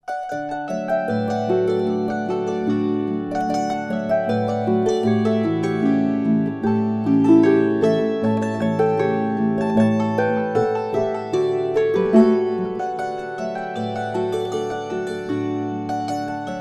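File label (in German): Hirtenpolka, Harfe; Alpbacher-Hirtenpolka; ADVENT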